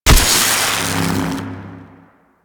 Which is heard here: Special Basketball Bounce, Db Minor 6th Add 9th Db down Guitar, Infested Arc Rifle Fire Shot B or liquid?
Infested Arc Rifle Fire Shot B